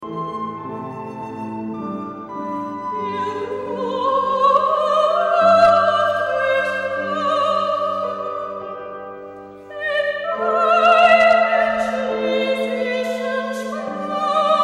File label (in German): Deutsch polnische Litanei Ausschnitt